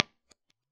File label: 4x12 G12M-65 U87 0.5in; IRs